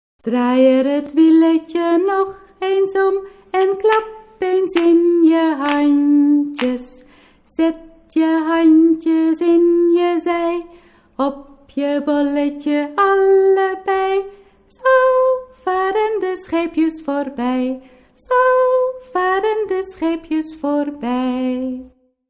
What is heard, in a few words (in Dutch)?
draai er het wieletje